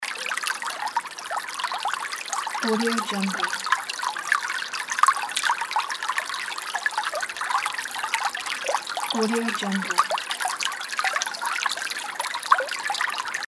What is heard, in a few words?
water